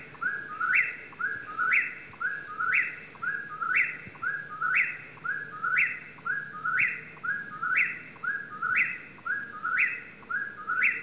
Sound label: whip-poor-will caprimulgus vociferus; don jones; The Futuristic Whip-poor-wills